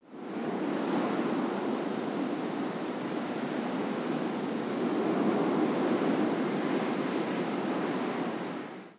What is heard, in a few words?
WIND; outlaws